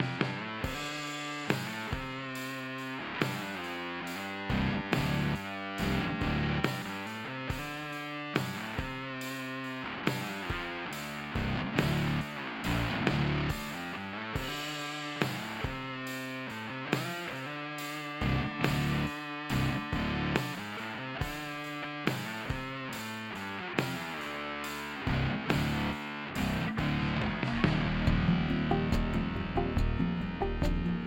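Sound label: heavy, electric bass; Old Demo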